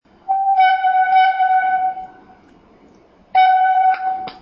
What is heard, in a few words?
airhorn, bicycle; triomphe